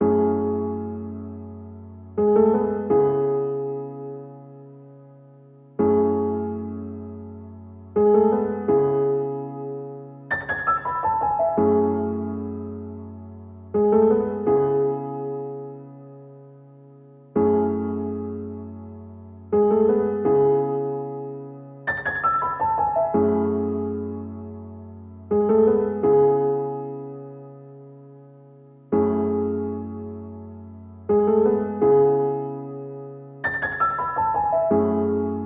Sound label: 166 BPM C# Min Piano; Loop Stems; Cymatics; Fallin 4 U